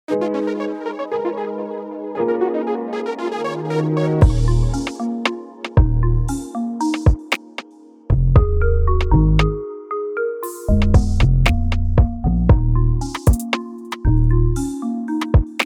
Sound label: ambient occlusion 116 bpm